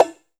SYN FX, PERCUSSION; AKAI S6000 CD-ROM - Volume 5

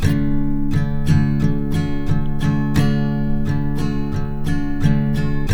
TK MJ D#, Guitars; Spectrasonics; Hans Zimmer Guitars VOL-1

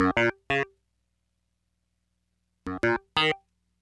SYN, Hip Hop and R&B Drumloops; Best Service ProSamples vol.01; AKAI

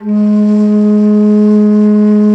BASS BANSURI; E-MU Producer Series Vol. 7 – Old World Instruments

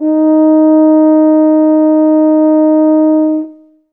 tuba D#3; Best Service ProSamples vol.51 - Classic Orchestra 2

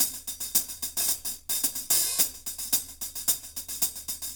4 4 hh, Percussion; Big Fish Audio - DOM

